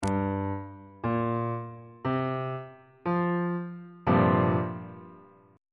b sharp dominant 7th suspended 4th 2nd inversion on bass clef